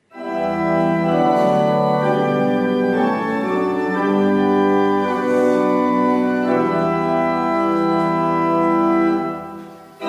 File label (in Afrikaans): Gemeentesang; Psalm 24-1 vers 4, 5